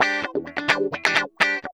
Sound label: GUITAR LICK; Ueberschall; Jam Box